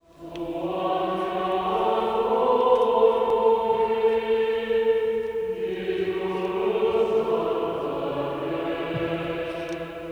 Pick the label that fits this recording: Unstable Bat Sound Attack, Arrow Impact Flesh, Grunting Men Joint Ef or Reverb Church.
Reverb Church